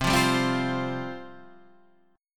C Suspended 4th C

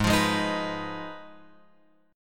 G sharp Minor 6th G sharp down Guitar Open F; G#m6 strummed; JGuitar

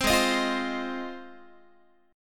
G sharp 6th G sharp down Guitar, chord; G#6; strummed; 15,16,18,15,16,x

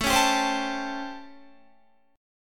A 7th Sharp 9th A, Guitar

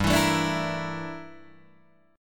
G Minor 6th Add 9th G down Guitar DADGAD

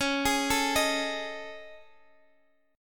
A Major 7th Flat 5th; chord

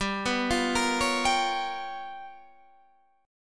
G 7th Sharp 9th Flat 5th

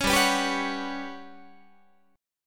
G Major 7th Flat 5th, Guitar; GM7b5; strummed

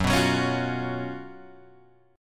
Gb Major 7th Suspended 4th, down, Guitar, Open G

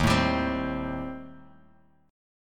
Eb 6th Flat 5th Eb, Bass Standard; strummed; 11,12,17,17

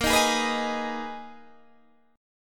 Ab Minor Bb, chord; Abm/Bb; strummed

